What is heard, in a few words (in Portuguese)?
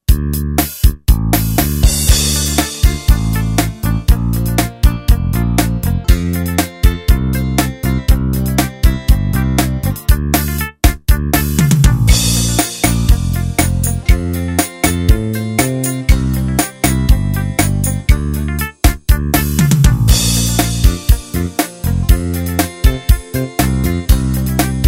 brega, ROLAND G70